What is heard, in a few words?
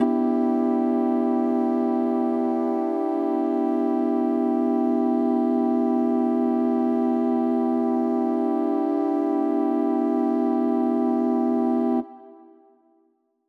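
Mixed Winds E minor, synth orchestra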